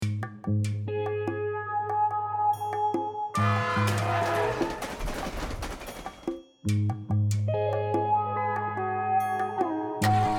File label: slo funk train